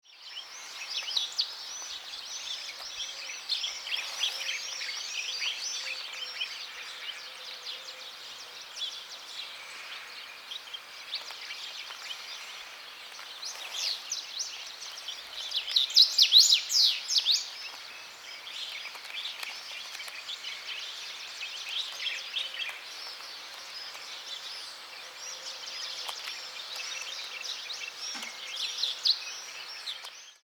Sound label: birds terrace